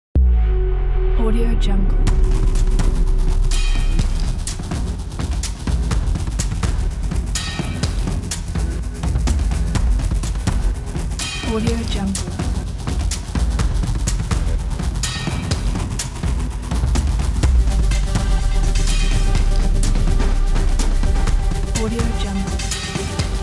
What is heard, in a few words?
atmospheric cinematic percussion